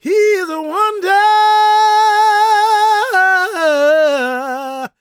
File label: GOSP MALE, Vocal Planet Gospel; Spectrasonics; Vocal Planet Vol.1 Gospel; akai